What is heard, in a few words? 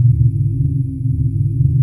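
Ambience Sci-fi Hum Loop; SFX Kit; Sci-Fi Loops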